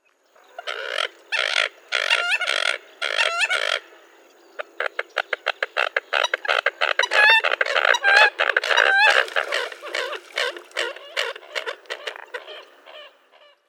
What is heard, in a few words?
american coot; paul marvin